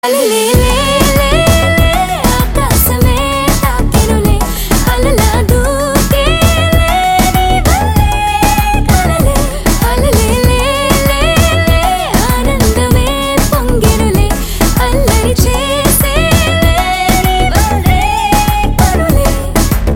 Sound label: Female Love Ringtone